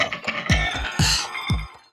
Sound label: TXT Tone; Virgin Mobile USA